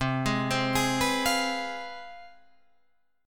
C Major 7th Suspended 4th Sharp 5th, C, down, Guitar, Open F; arpeggiated; CM7sus4#5